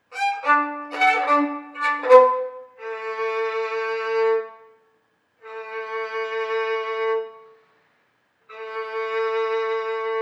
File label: Violin Anguish and Despair; Cheryl Violin Project